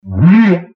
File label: big whale